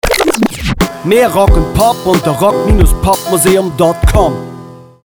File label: Hip Hop